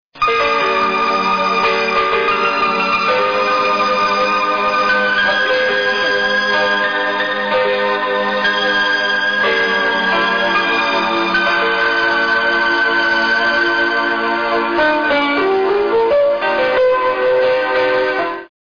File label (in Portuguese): orgao